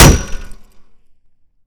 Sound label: Chair Hit, Metal Chair; zps; Weapons; Melee